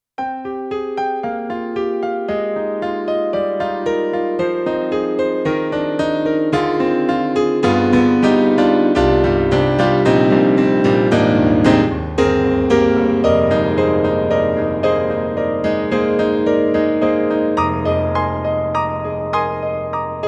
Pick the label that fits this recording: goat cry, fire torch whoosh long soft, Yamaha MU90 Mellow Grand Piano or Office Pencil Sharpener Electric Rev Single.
Yamaha MU90 Mellow Grand Piano